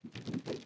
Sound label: ambience room shadow room working layer